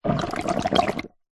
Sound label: foley liquid water air bubbles surface shallow short plastic